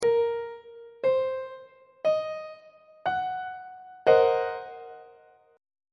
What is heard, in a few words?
b sharp half diminished 7th 3rd inversion; on treble clef